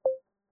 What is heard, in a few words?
mic on